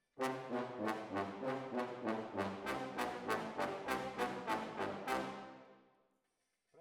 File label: ORTF, Jazz for piano